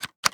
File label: Tutorial Slide Out